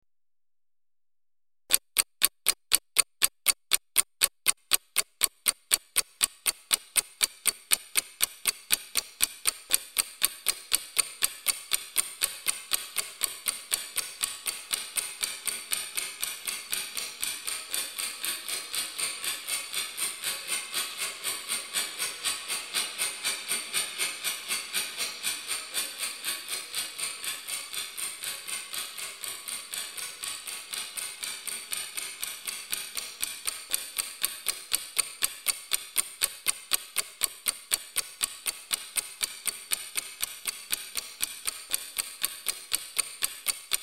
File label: EAReverb 2 Clock